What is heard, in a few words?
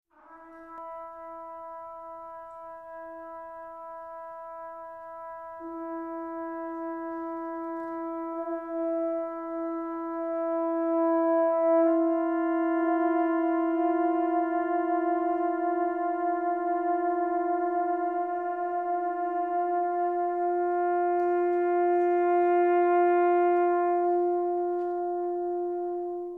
prelude